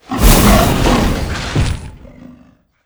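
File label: wind leo death, monster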